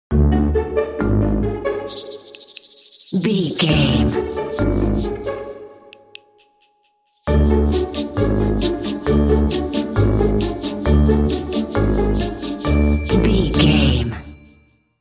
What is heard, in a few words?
stealthy tiptoeing